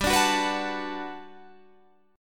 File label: G Major 9th, G, down